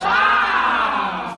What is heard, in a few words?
"wow" crowd; ooh ahh